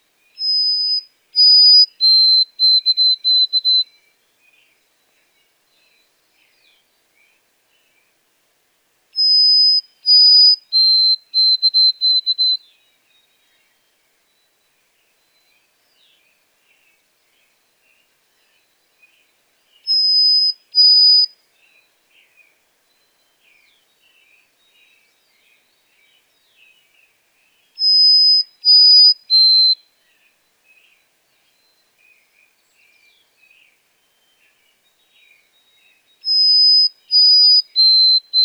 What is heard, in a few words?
White-throated Sparrow